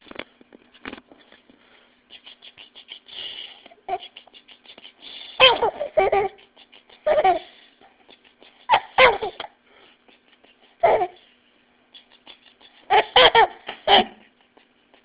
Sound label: laughing